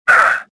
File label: land; Star Wars Jedi Knight II Jedi Outcast; XBOX; stofficer